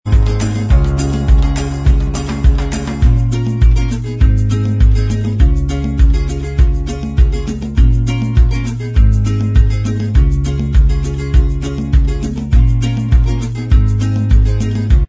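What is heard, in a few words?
Inst Track, instrumental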